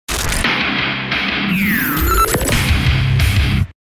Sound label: Rockin' Pounder, DJ sounds